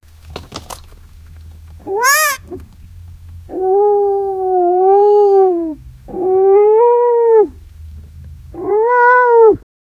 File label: a cat and a dog; deer